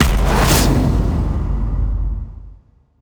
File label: gambler draw; DragonsLucky8WD; wazdan; gambling